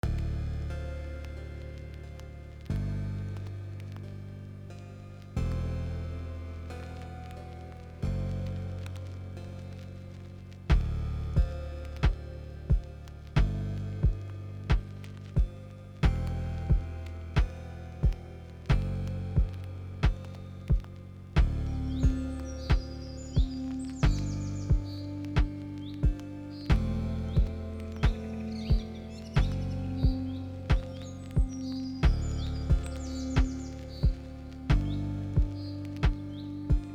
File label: atmo